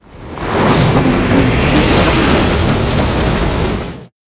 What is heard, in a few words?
gas tank flame; combine soldier